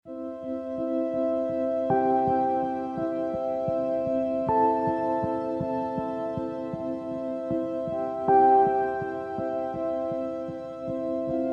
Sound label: Ambience Version; Nordic Drama Textures